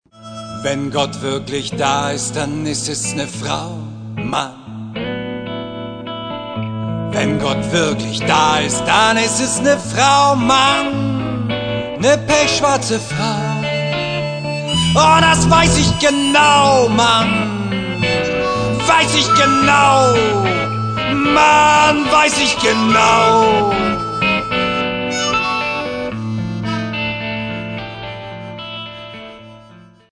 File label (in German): Goettlicher Blues